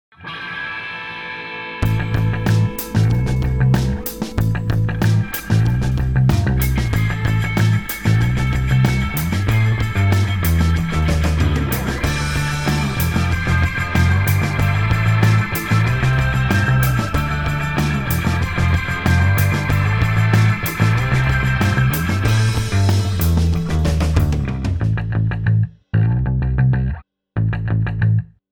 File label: music; insurance and finance; tv ad music